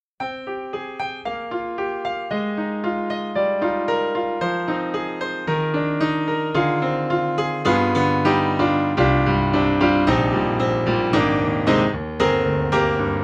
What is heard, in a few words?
Italian Grand, Player Mic; VI Labs; True Keys